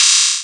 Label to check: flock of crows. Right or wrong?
Wrong